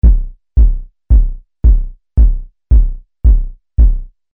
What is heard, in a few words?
Kick Ondes VCO Sys 500 Filter